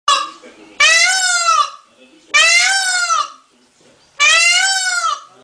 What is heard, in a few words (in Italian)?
pavone, Uccelli; Suoni